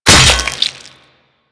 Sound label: Axe Impact Flesh; Warframe; Weapons